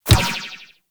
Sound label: Ball Bounce Dirt; Warframe; Tenno Ball; Missions